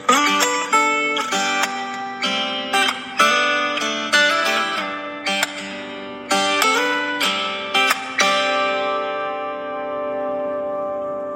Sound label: Samsung